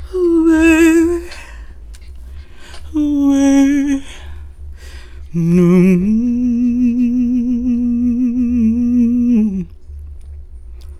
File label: FEM VOX, Hip Hop